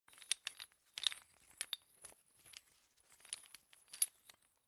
Handling Old Heavy Rustly Chain Movements

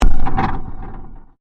Convolution Pulse

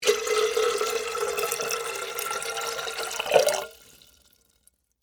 Water Pour Teapot, Into, Metal; Pouring

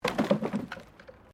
JUNK, Debris

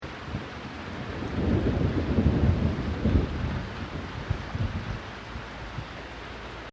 THUNDER; Elemental weather effects; Thunder (Sound Effects:Weather)

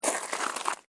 SNOW CREAK

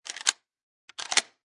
Shotgun Rack, Rack/load; Gun Handling; Guns

